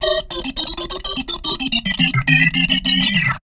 B3 LICK C

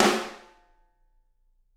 SD, Drums; Bob Clearmountain Drums I

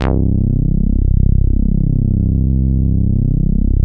MOOG TAURUS PHAT C1; Big Fish Audio - First Call Vintage Keyboards

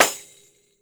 PANDEIRO, Brazil Drum Perc; E-MU Producer Series Vol. 7 – Old World Instruments

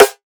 drum snare breaks; Virtual Riot; Drum Hits

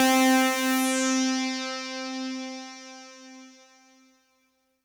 Synth C, Synth Prophet One Shots; Electronisounds

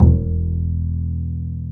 STR Cb Pizzicato; Roland L-CD702

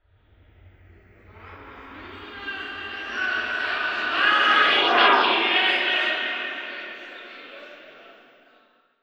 SCREAMSPRG, Anime Sound FX; FLYOBJECTS

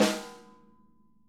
SNARE, Interactive Drum Kits

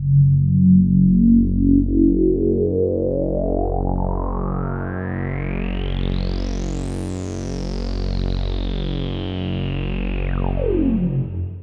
F#, Drum & Bass Pads; Total Drum&Bass; 90 s Sample CDs

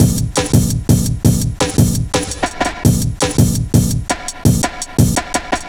Old School, Jungle, Drum Loops 168.5; Zero-G Jungle Warfare 1, 2 & 3

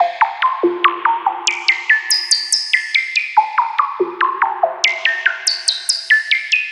Percussive

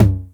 TR909 TOM; East West - Global Sounds

